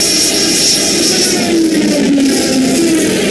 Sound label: RAPIDE; STARS FXS; East West; Global Sounds